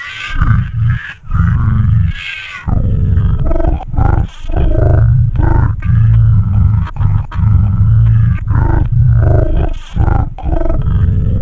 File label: tape; XBOX; Fatal Frame 1